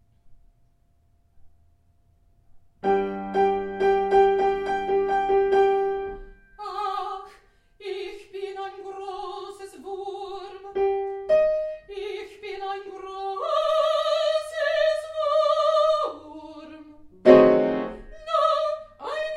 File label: Twelve Tone Piece; Learning the Twelve-Tone Piece